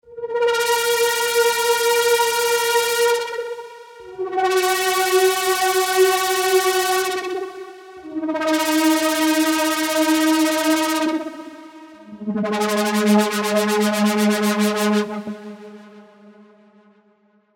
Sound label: Shivers, Serum